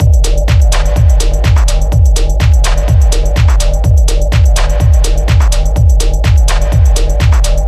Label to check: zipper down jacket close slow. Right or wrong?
Wrong